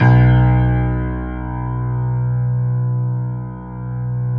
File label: PIA HIGH G, Grand